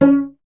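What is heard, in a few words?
STR VC C#0, strings cello; Miroslav Vitous; Solo Instruments VOL-1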